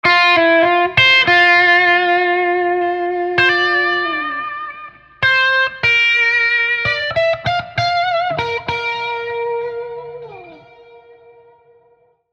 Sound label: Guitars Melodic Pulses and Phrases; Scoring Guitars 2